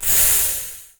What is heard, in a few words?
burn; HotPlate